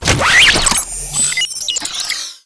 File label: cockpit activate, vehicles; Tribes2